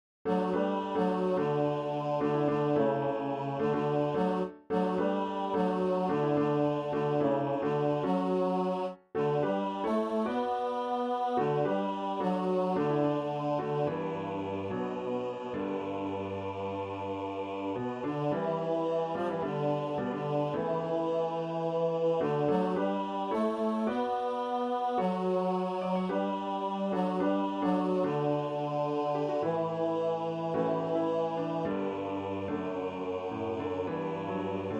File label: bass 2 D; Fellowships – Bass 1 practice file